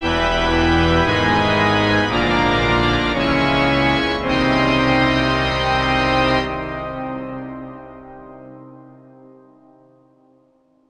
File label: Sitar